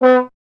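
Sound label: bass trombone B; Miroslav Vitous; Solo Instruments VOL-1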